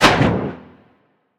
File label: fire; smite; scylla